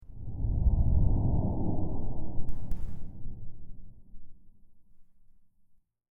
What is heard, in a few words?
swoosh